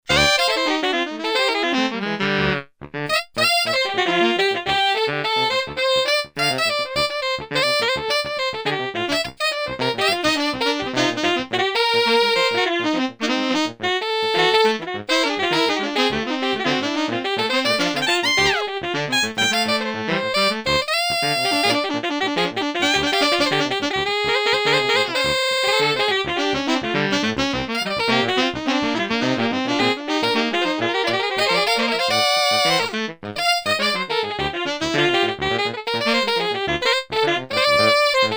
Tenor Sax song

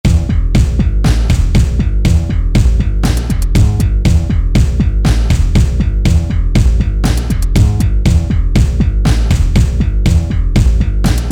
Industry

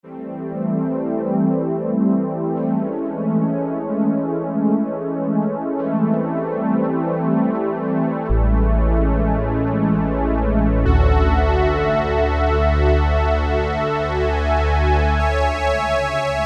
Soundtrack, Roland MKS-70

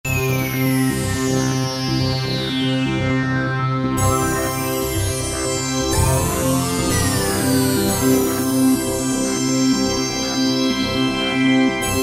Sweep Loop on C; Roland PN-D50-02

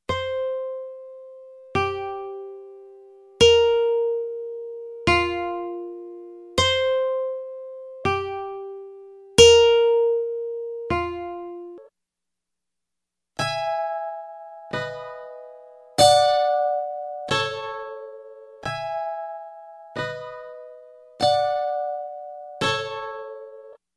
PITCH CHANGE C, Yamaha REX50

